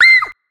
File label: scream high